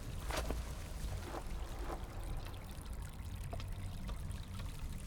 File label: fly; gris